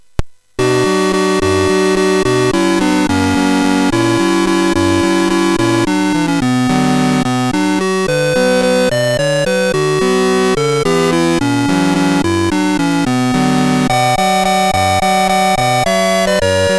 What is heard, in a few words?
Tum Balalaika, MOS6581